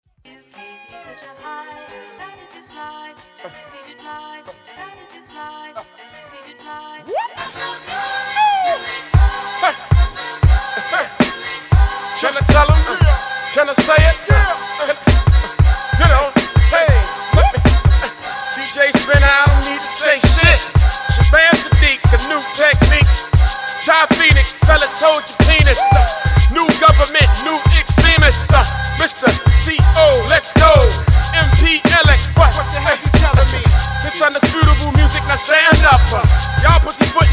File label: polyrhythm break glass